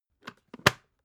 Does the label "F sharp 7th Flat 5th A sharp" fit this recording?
No